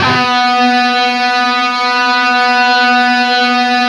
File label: LEAD, Guitar; East West; Steve Stevens Guitar Samples Collection